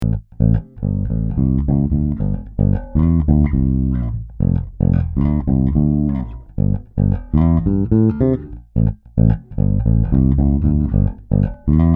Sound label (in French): Did Serie Passif, basses